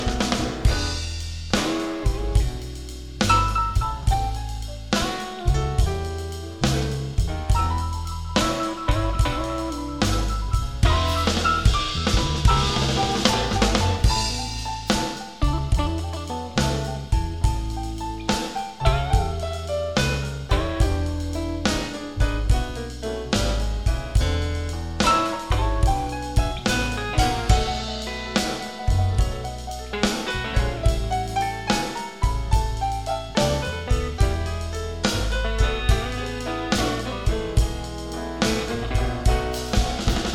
Slow Blues 6 8 feel 12 bar Piano Soloing

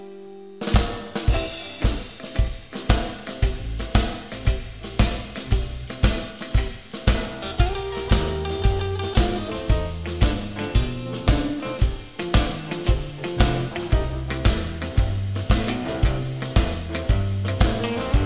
Medium Chicago Shuffle 12 bar Guitar Rhythmic

